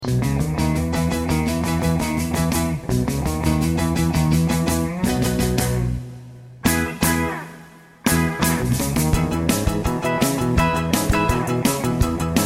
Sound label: Karaoke Version